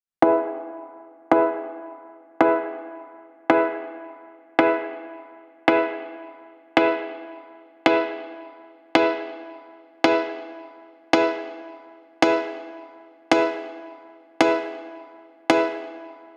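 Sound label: piano 110 E minor wet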